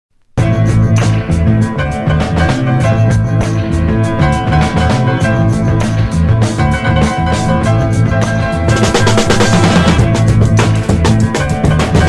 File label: Instrumental